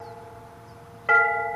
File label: Bell Buoy Ring